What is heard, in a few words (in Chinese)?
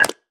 泥砖踩踏声